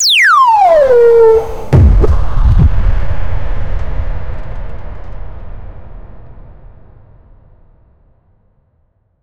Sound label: create the sound exploids, nuclear blast